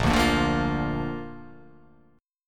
A sharp Major

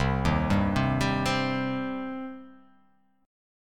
C Minor, chord; arpeggiated; JGuitar

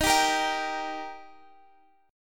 Eb Major Eb down Guitar Open F; strummed; JGuitar

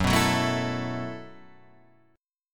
B 7th Suspended 4th F sharp

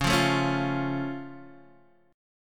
C sharp Augmented C sharp Guitar Half Step Down

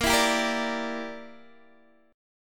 A sharp 6th Flat 5th, Guitar DADGAD

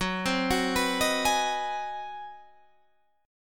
G sharp 7th Sharp 9th

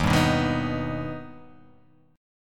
D sharp Augmented D sharp; x,6,5,4,3,6,5,x

